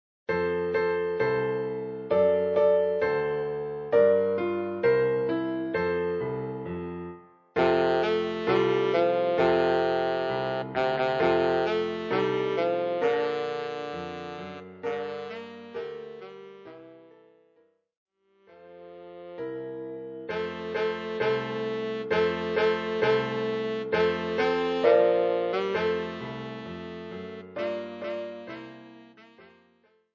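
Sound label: tenor saxophone; für Tenorsaxophon und Klavier, Schwierigkeitsgrad Klavier Level 4, mittelschwer, mit Vorspiel; Jingle Bells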